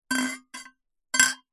household empty metal cocktail shaker bash against drinking glass; zapsplat